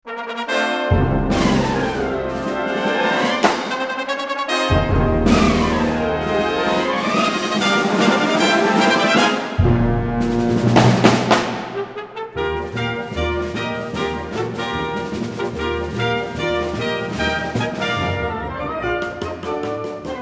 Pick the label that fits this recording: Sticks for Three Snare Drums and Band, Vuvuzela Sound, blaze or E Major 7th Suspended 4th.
Sticks for Three Snare Drums and Band